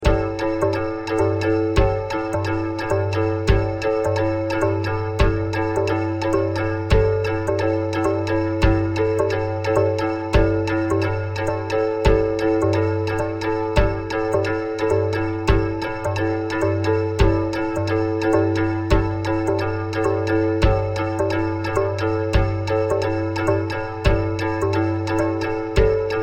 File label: 2 5 polyrhythm